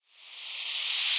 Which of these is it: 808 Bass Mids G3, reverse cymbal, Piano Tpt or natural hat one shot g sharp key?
reverse cymbal